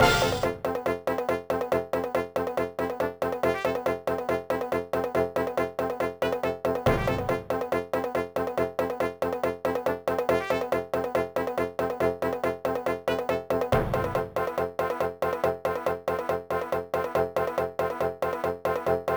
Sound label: Sword Training; the legend of zelda phantom hourglass